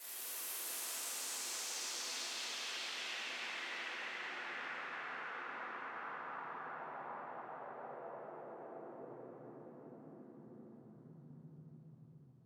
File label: 134BPM Sweep Fx; Sound FX; Cmajor